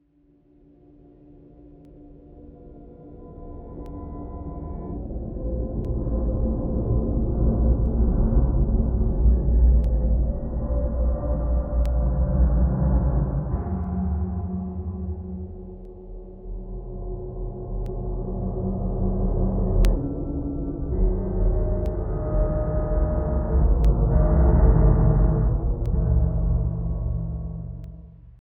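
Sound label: Second Floor Exploration; condemned criminal origins gamerip; Apple Orchard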